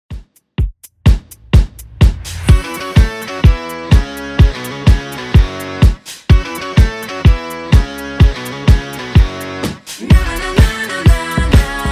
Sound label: 20s Redrum 126bpm